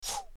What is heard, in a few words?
Woosh; Assemblee SFX